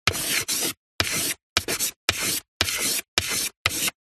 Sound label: Handwriting Chalk Medium; D&H; soundbox library